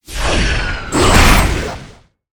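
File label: guardian Bastet attack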